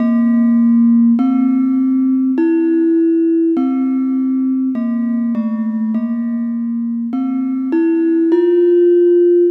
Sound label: Pelog Unison Gender; Melodic; Ambient-Melodics-II